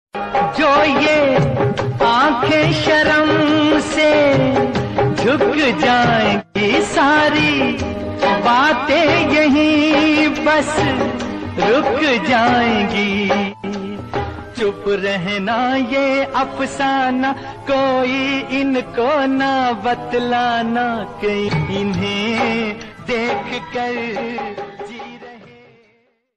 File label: old is gold old hindi song ringtone bollywood old hindi ringtone old 90s ringtone